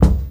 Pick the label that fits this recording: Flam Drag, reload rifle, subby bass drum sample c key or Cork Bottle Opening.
subby bass drum sample c key